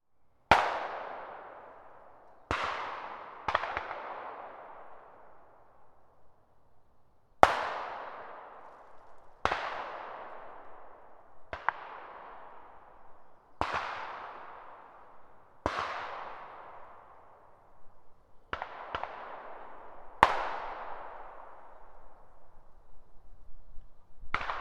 Forest Gun Shots And Gunfire

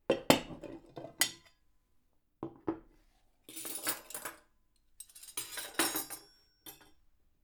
Setting Up The Table

